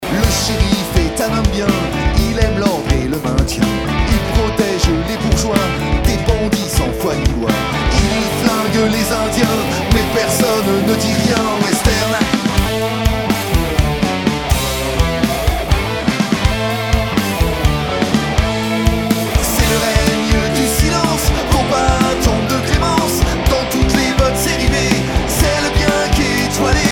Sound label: western; L. Dervillez